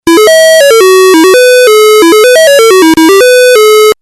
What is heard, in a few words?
PV1000